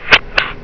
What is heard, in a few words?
CLICK